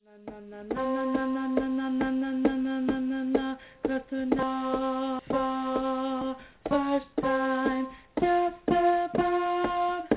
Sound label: Tenor